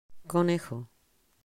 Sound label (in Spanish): conejo